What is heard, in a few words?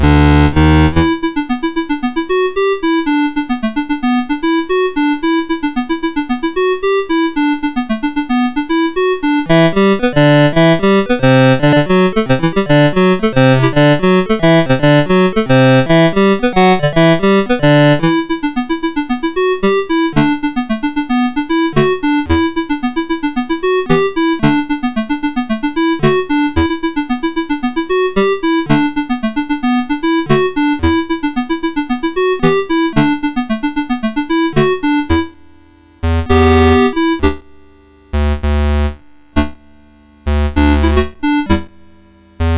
Conga, MOS6581R2